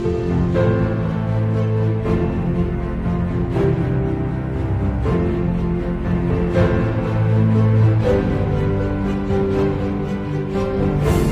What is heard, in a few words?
Action uplifting